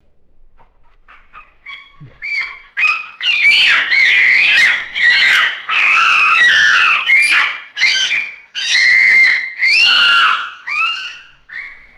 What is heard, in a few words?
juvenile baboon screaming and squeaking outdoors; animals